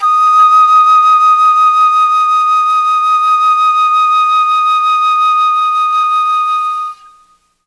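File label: D#4 chamberlin flute, Mellotron; Mike Pinder - Mellotron Archive CD; CHMBLN FLUTE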